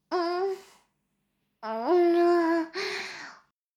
フェラ音声, ASMR; 少尉; 牧場戦腺

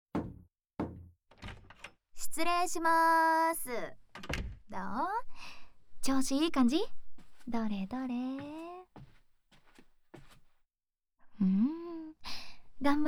イヤホンガサゴソASMR; 謎のASMR授業！?

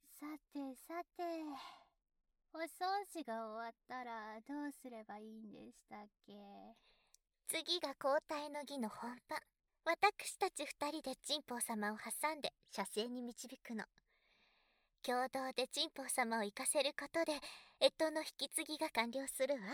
両耳舐め舐め; ねずうし交尾; 交代の儀

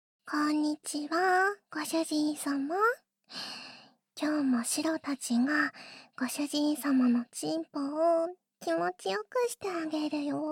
耳舐めフェラチオ♪（効果音無し）; 猫耳オナホペット三姉妹を買える未来がやって来た!; ダチュラスクリプト; キャットシスターズ

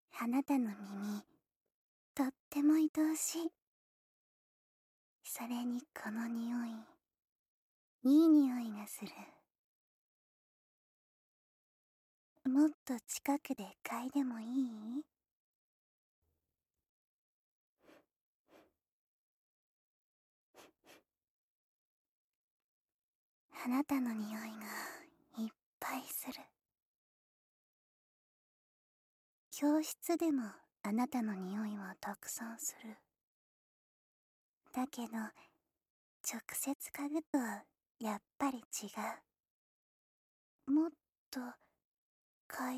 耳舐め, ASMR

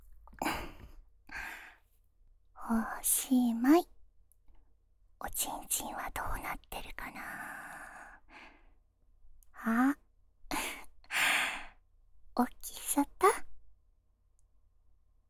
スキ×耳舐め; おち○ちんチェック♪; 3人のお屋敷メイドの週末おま○こ奉仕; 深雪; seなし差分

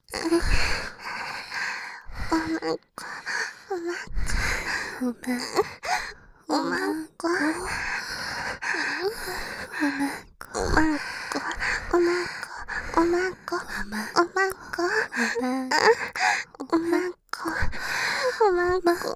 喘ぎ; お屋敷メイドのハーレム淫語ヘヴン 気が済むまで耳舐め淫語を堪能できるバイノーラルオナサポ音声

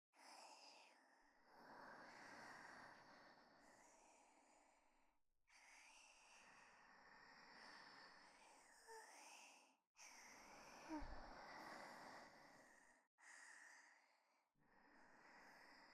寝息, ASMR; 寝息 白右 暦左

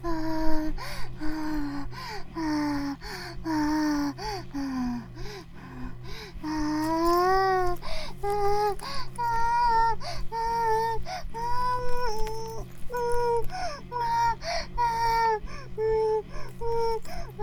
バイブ放置, ASMR

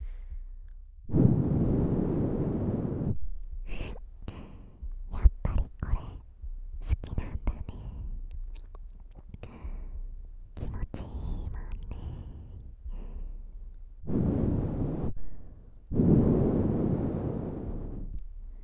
お姉ちゃんのお耳ふーふー（左耳）

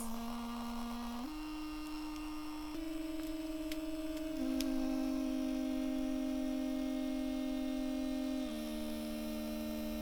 バイブ音, 効果音

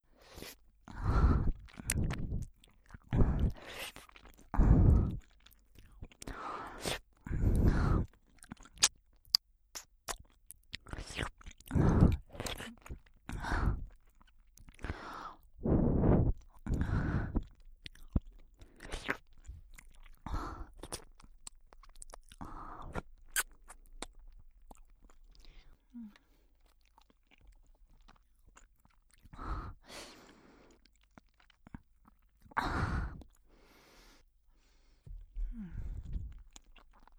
えっちな吐息と耳舐め

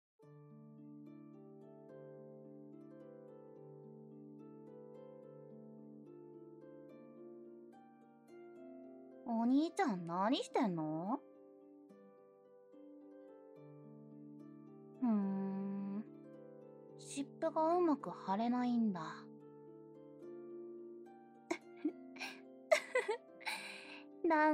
マッサージ; お兄ちゃんにマッサージ

